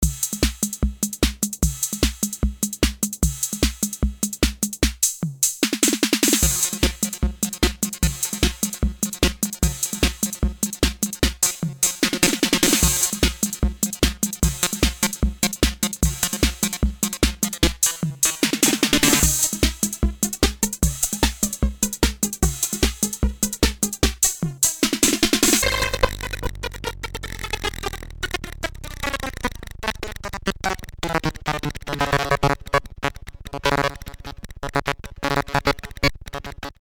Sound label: gravelbox